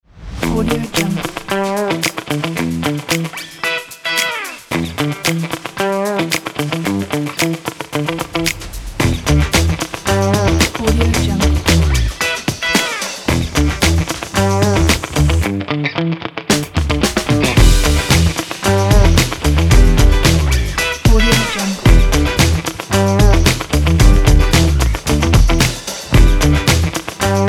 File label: funk